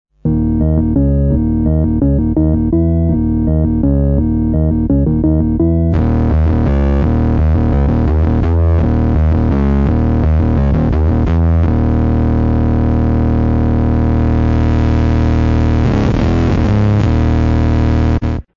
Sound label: mescalito bass organ